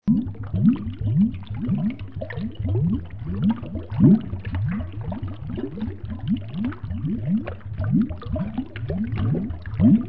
windness

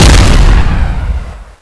rpg7 fire

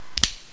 switchblade holster

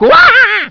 barnacle hit; supermariobros; luigi

